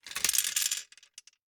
Gumball machine, metal, glass, candy, switch, mechanism, rotate, dropping, hyper; Eiravaein Works; Vaeyan IV